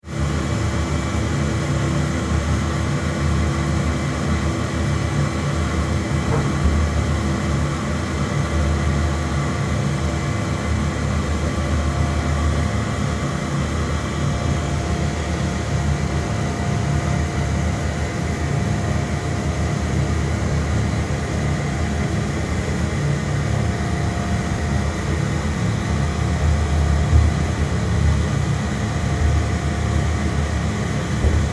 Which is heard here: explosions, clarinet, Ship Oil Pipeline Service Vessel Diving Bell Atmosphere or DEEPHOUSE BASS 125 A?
Ship Oil Pipeline Service Vessel Diving Bell Atmosphere